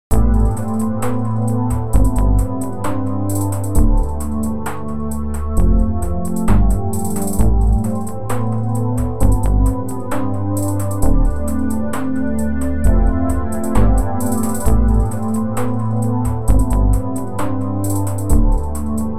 CZ Loop

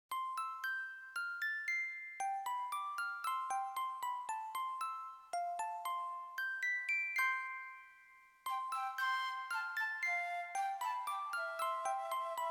Music Box; Saloon

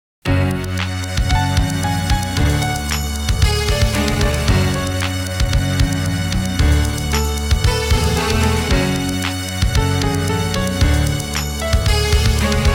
stringy tune

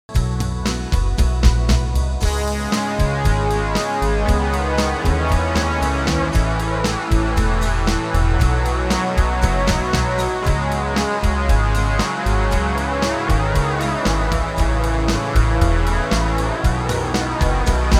solo only

